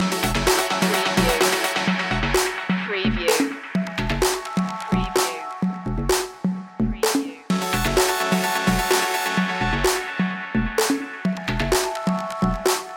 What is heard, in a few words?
square circles loop